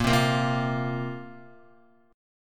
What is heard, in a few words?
Bb Suspended 2nd, Guitar; strummed; chordshape